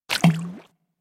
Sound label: Splash; Splatoon